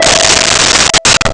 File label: Harp E5; Nintendo; July 2020 Gigaleak